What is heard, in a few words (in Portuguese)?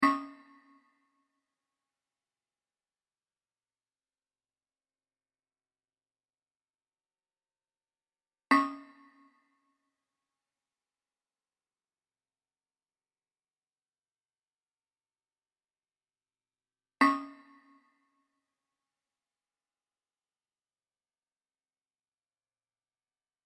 amostra de pulsos com periodicidade